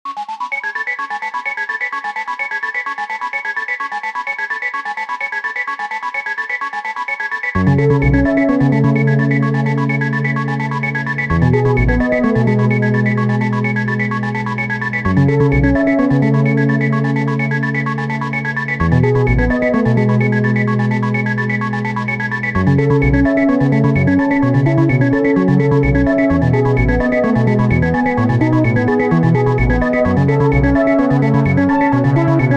A really old tune I’m trying to breath new life in with the Digitone